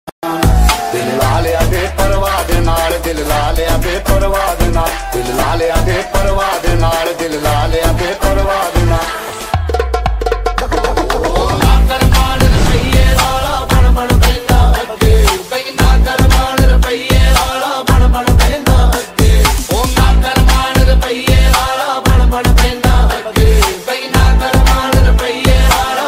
song ringtone hindi ringtone; roohi movie

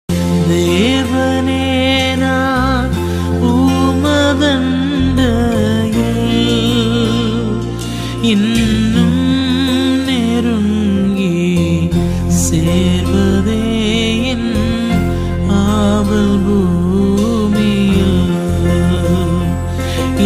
christian ringtones malayalam; power malayalam

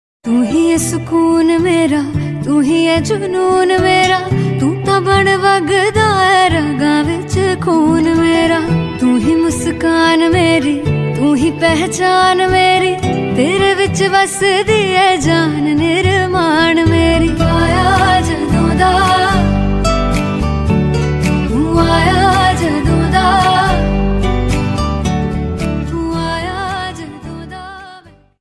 new punjabi song original ringtone

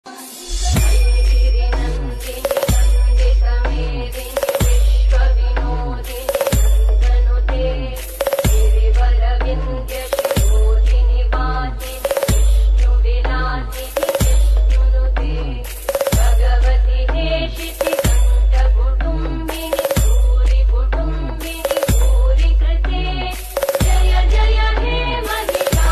ringtone navratri ringtone maa durga new ringtone; durga mata

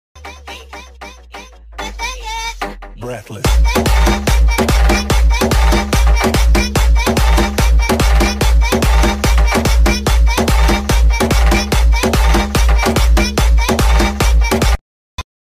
song bgm beats

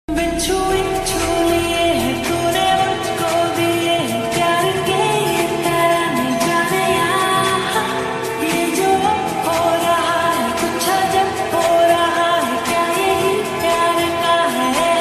soulful lofi ringtone

